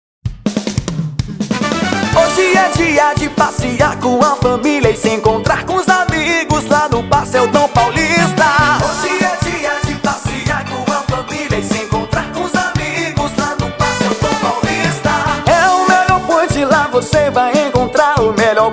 jingle; pastel dom paulista; kazamstudio

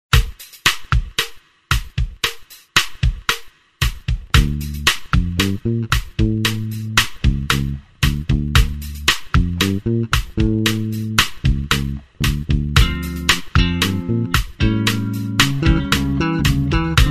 bass salsa jam; adal bass

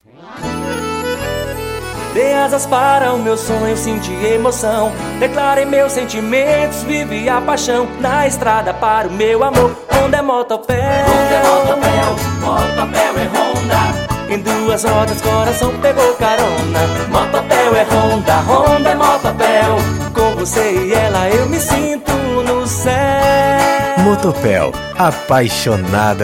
jingles; motopel

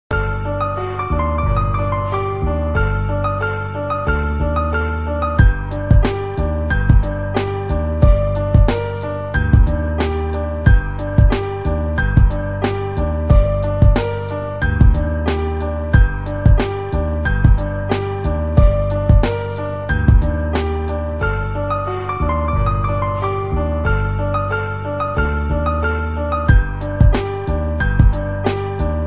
instrumental

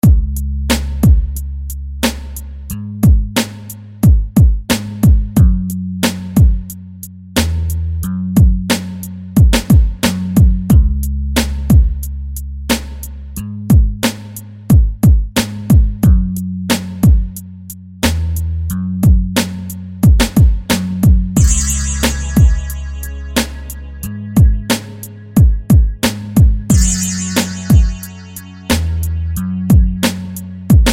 scratch

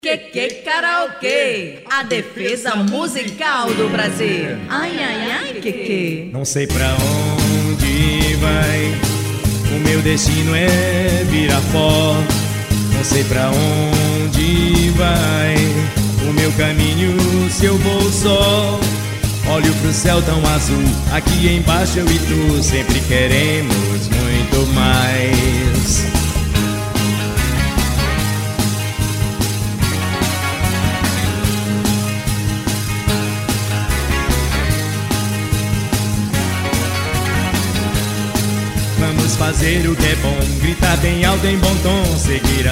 guitar

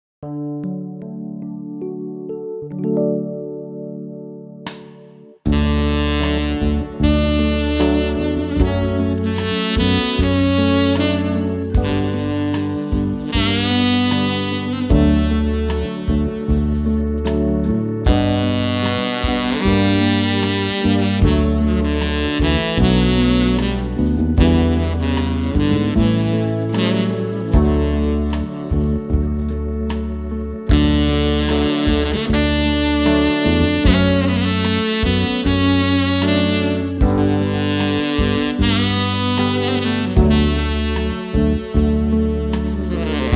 sax

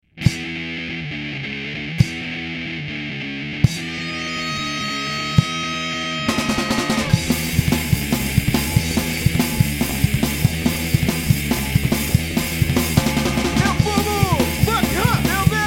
punk rock

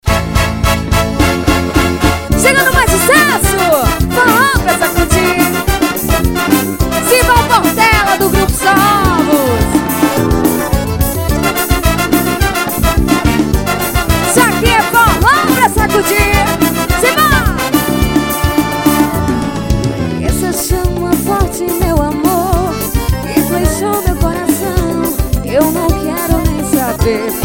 forro

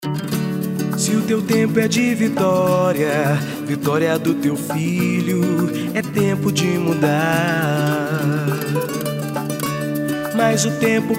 jingle; mitsubish manaus

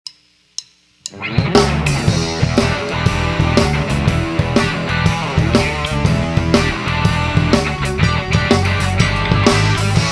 blues

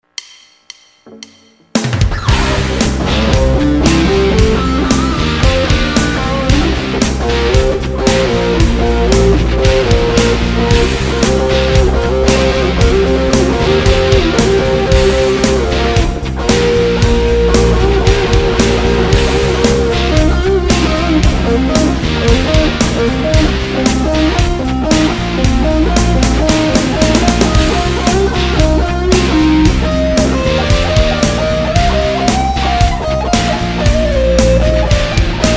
guitar metal; brinks

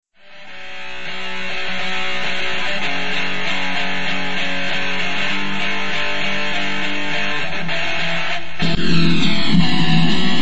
silent instrumental

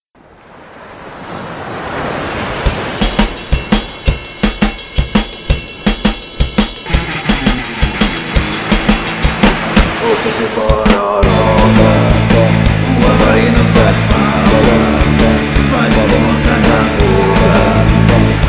rock n roll

